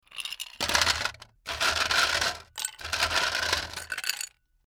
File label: ice